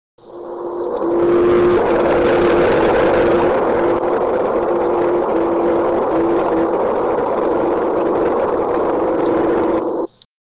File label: voskhod1 biomed telemetry